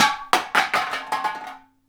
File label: can